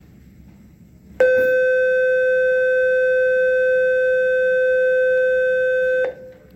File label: Modern School Bell